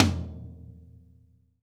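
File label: floor tom 1 rimshot,drums; 90s sample CD; drum kits large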